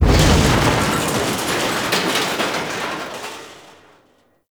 Military Structure Collapse; Warframe; Ambience; Nineteen Ninety Nine

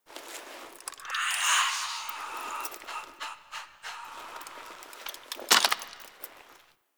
Idle Noble Rifle Variant; Warframe; Dante

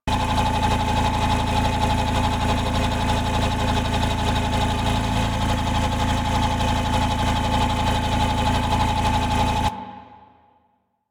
Injection Chamber Tube Mover Loop; Ambience; Warframe; Grineer Ocean; Ocean Sabotage Injection

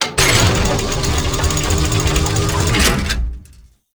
Asteroid Winch Raise Up; Warframe; Grineer Asteroid

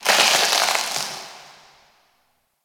Ice Close Ice Break Small; Warframe; Corpus Ice Planet

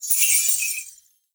Naginata Whoosh Tonal; Koumei Naginata

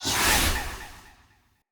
Trick Ghost Spawn Burst; Enemy; Abilities; Characters; Circade

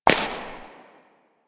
Step Ice; Warframe; Koumei

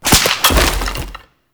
Bunraku String Drop; Warframe; Koumei

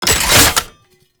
Rocket Launcher Reload; Weapons; Warframe; Tenno; Lasrian

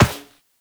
Operator Walk Metal Perforated, Footsteps; Warframe

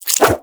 nunchuck start whoosh; Warframe; Infested; weapons

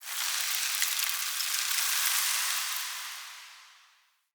Resource Pickup Embers

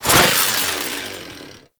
Hand Saw Whoosh

